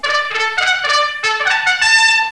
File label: trumpet announce